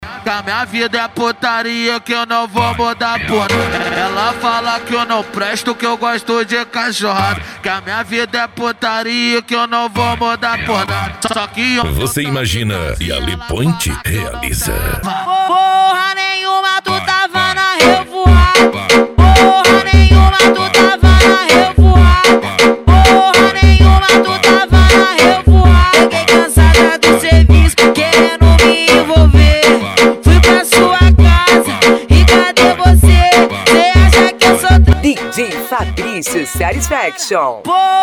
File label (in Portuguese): deep house e pisadinha